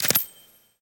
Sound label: Item Bought; Atelier